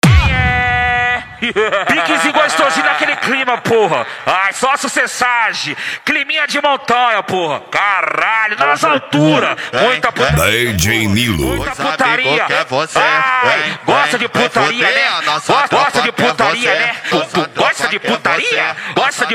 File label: set funk bass